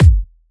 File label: Hard Kicks; Vengeance Samples